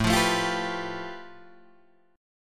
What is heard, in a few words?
A 7th Suspended 2nd Sharp 5th A, Guitar Drop D